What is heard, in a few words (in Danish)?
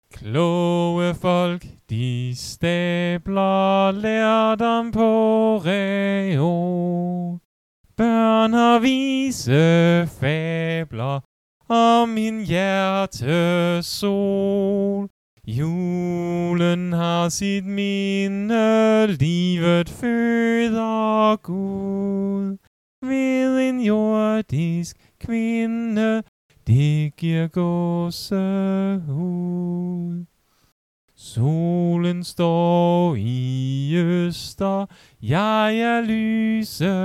Vokal; Kloge folk de stabler.